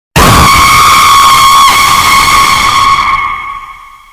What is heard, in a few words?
shocker